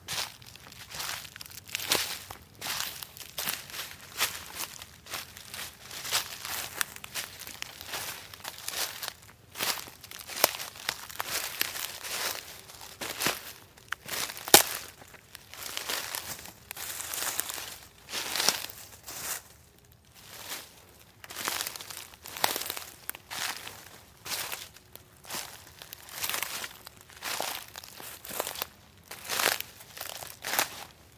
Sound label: walk on leaves